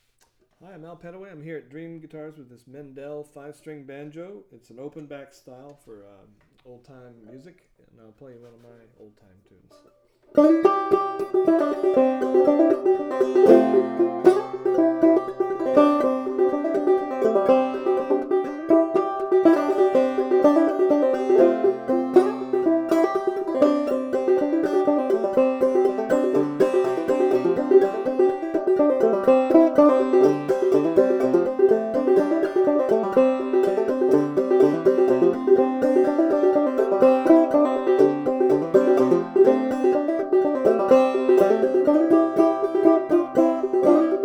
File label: mendel banjo; banjos; open back